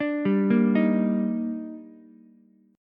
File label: Eb Major 7th, Ukulele; EbM7; arpeggiated; JGuitar